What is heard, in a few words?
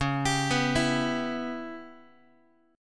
C sharp Minor 7th Flat 5th C sharp Guitar; C#m7b5 arpeggiated; x,9,10,9,8,x; JGuitar